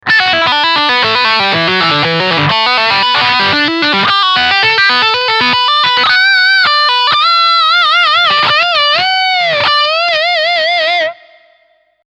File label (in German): Leadsound Steg HB